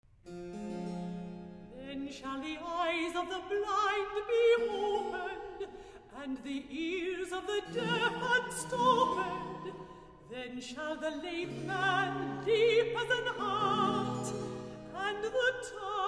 Then shall the eyes of the blind - Alto Recitative; Boston Baroque; Handel Messiah